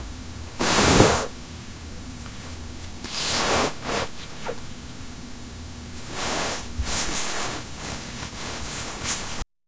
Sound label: someone grabbing onto you; interaction